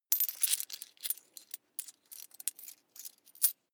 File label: coins search